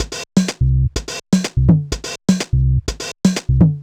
perc tweak 125, Percussion Loops, Classic Chicago House